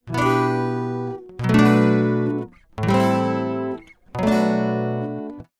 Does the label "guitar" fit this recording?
Yes